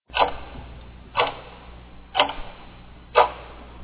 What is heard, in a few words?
clock